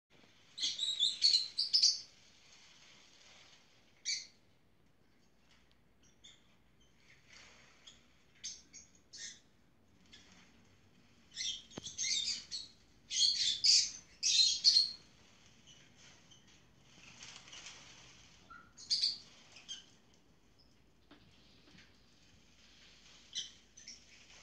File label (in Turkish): Cennet papagan i sesi